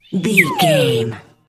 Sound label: whistle slide down